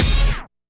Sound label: Stab